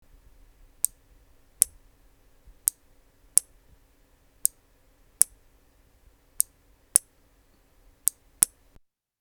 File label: relay click